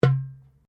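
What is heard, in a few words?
Drum Darabuka Edge mezzo forte, Egyptian Darabuka Drum; Drums; Musical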